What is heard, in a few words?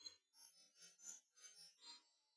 Glockenspiel